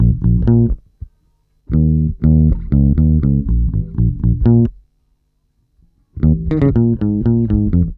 bass, Roots Disco; D#M; USB Soundscan vol.32; AKAI

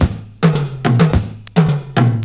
PERCS, 70 s Breakbeats

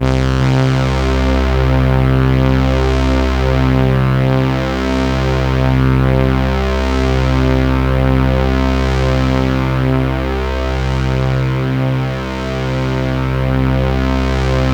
C2, SynthLine

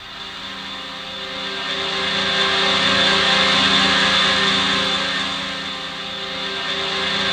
ATM TONAL; Best Service - XXL Production Effects

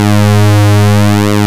101 PWM; INIS - Vintage

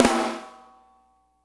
FLAM, snares; Spectrasonics - Burning Grooves CD2

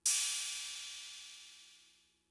Cymbal; Fricke MFB-522

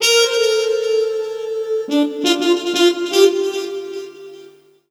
sax, Roots Funk; USB Soundscan vol.41; AKAI

